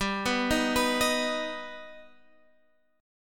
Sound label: G Augmented G, Guitar Standard; G+ arpeggiated; 15,14,13,16,16,x